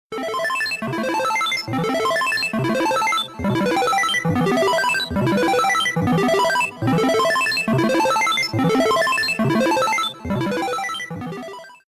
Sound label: Old Era Sound